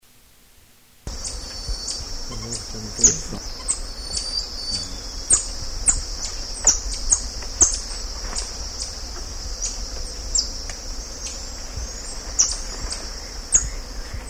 Golden lion tamarin and common marmosets, Atlantic rainforest, Brazil